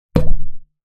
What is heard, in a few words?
Cartoon accent impact thud